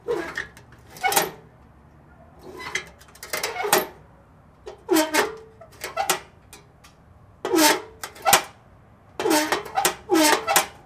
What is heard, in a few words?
Old Mailbox